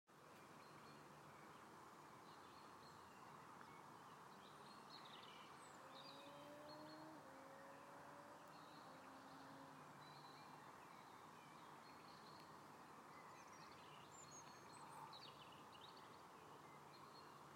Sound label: spring sound